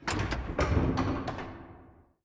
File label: Lift Activate